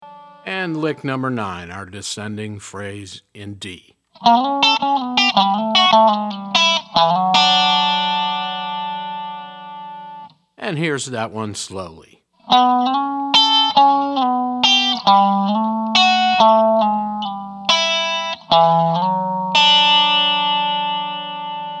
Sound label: resonator pedal, steel guitar